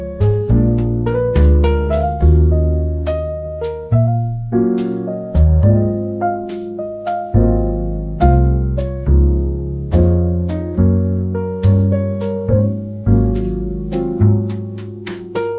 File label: christmas song; estate christmas23